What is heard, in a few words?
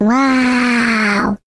Classic Miss Sound; Jolly Battle